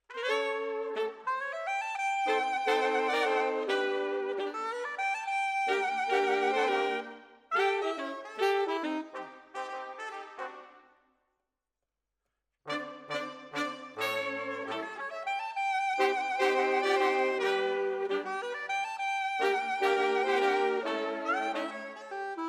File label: Sax